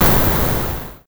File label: explosion